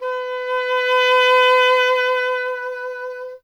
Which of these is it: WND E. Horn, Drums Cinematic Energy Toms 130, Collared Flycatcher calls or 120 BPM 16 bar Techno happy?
WND E. Horn